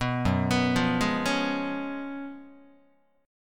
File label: Gb Major 7th Suspended 2nd Suspended 4th, down, Guitar; arpeggiated; 2,2,2,3,0,0; JGuitar